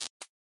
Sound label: glitch